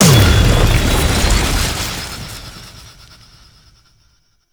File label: Plunge Ability Shockwave; Enemies; Abilities; Warframe; Duviri; Dax Knight